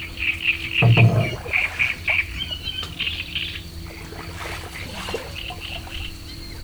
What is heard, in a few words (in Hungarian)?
evezés közben, nádirigó; termeszetben